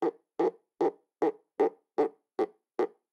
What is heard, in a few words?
Insect Weird